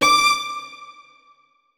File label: notes, keyboard; Hideout Furniture